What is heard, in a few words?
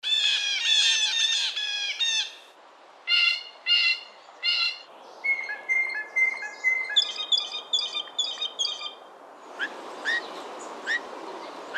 jay blue; species/birds; wbba